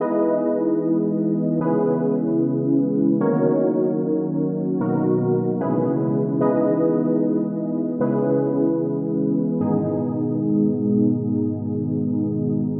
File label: Chill Loop 150 BPM G# Min, Future Bass, Synths, Chord Loops; Heater